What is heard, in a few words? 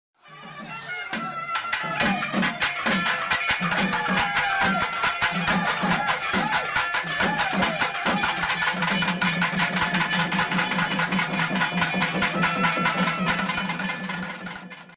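naiyandi melam south devotional background music; karuppasamy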